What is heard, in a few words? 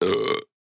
Burp